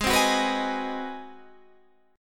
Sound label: G sharp 7th Sharp 9th, Guitar, Open G; down; chord shape